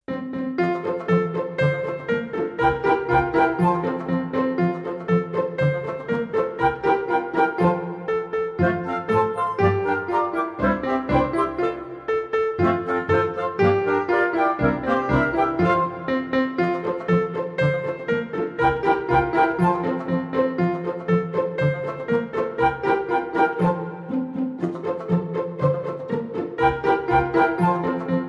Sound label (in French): Ainsi font font Instrumental